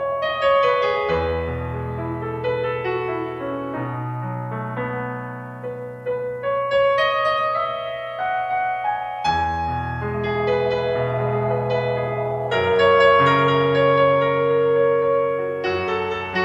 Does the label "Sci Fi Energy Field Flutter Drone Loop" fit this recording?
No